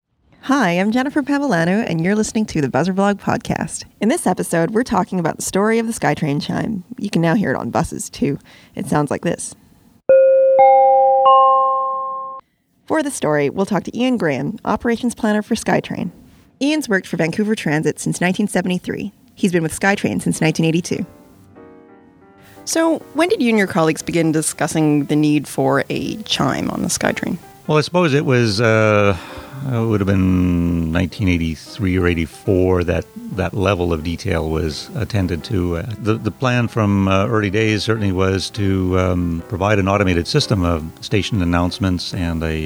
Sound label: skytrain chime